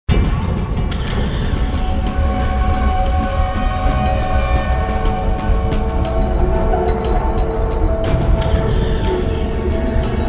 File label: moathouse combat; Temple of Elemental Evil